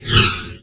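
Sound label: spell power up, monsters insect; Heretic II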